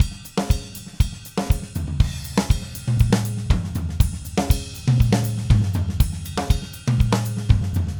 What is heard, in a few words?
Green Maple Groove 120 BPM, Vital Drums, DW Green Maple Kit; Sonic Foundry (Sony Creative Software)